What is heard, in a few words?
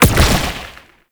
Proto Infested Brachiolyst Teleport Start